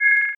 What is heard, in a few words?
Science Fiction Sci-Fi Electronic Beeps